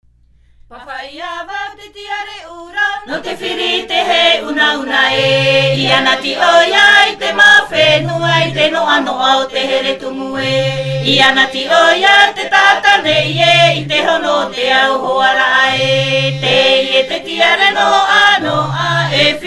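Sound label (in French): Himene tarava Tahiti, chants polyphoniques maohi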